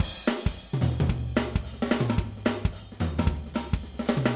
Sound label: Live Drums